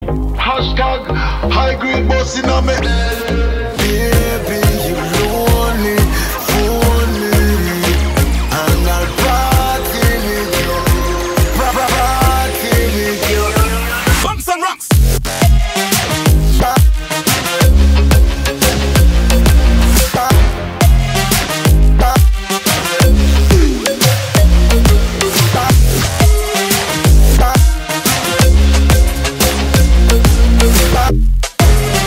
hip hop rap trap mix

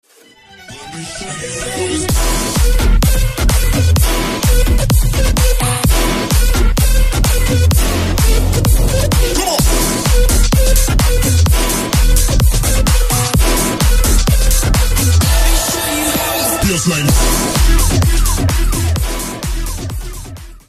bassline

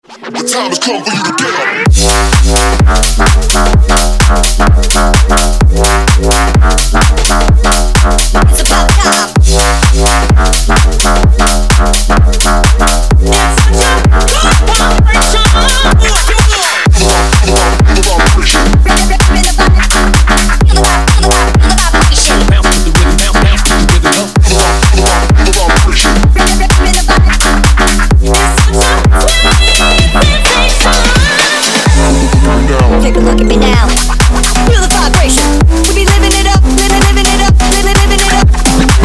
bass house

